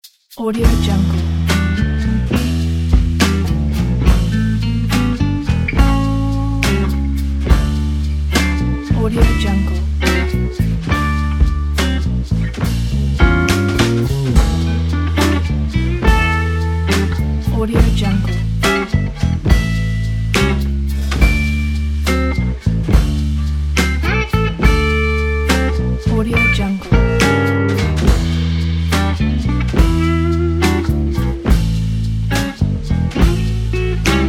seaside lounge

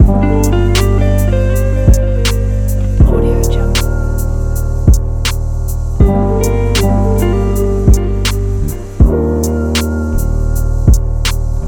relaxing loop

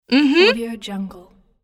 female voice hm hmm

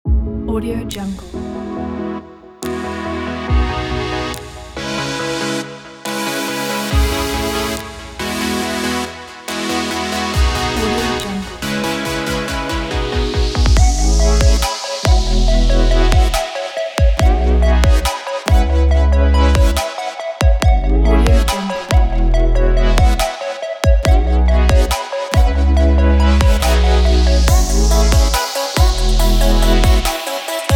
positive future bass; penguinmusic

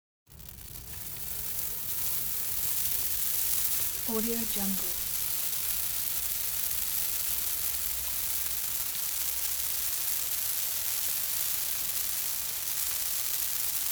christmas sparklers

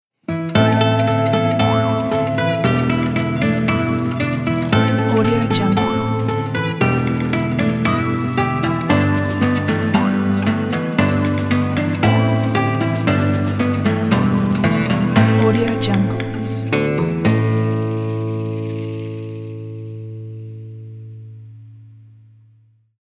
old west ident

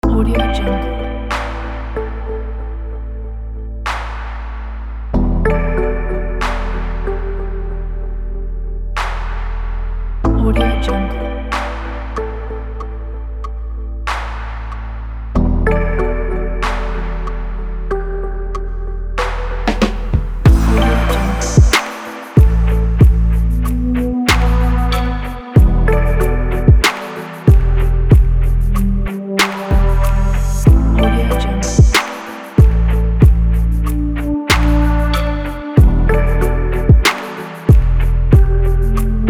lounge dive

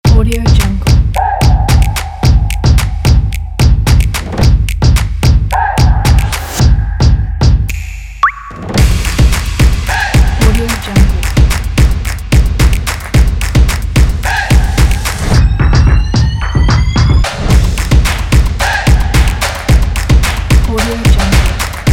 drums on

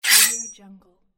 knife scrape

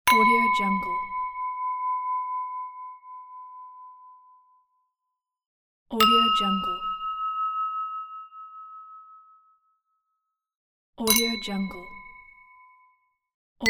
crystal glass clink

